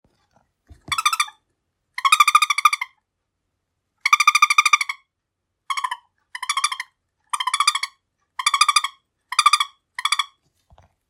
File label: Shaker Chirps, fox call